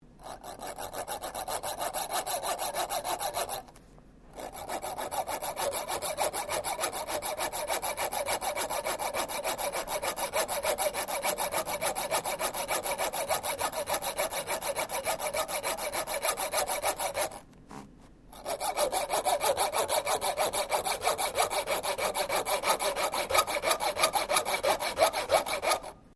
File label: SAW COPING SAW CUTTING STEEL; small domestic coping or hack saw; Saws; Machines